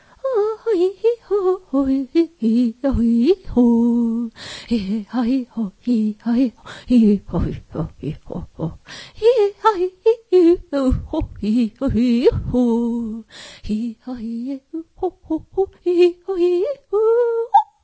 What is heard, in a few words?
Walk